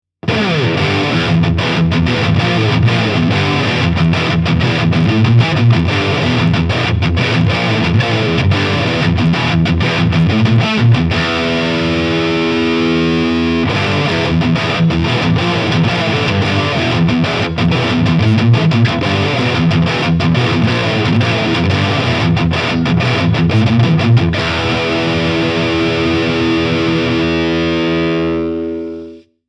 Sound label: Solar Guitars E1.6 High Gain; Vinter Perl White Matte